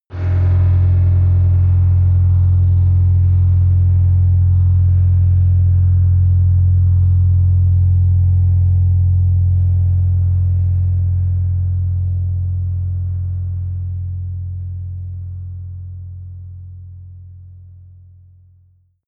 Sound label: protective shield active low; energie